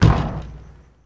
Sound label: Street Ball Wood Back; SFX Kit; Street Basketball